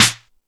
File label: All Snares, E-MU Pro-Cussion; drum machine